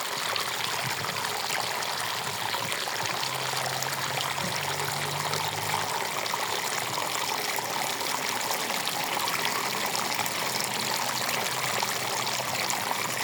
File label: Water sound effect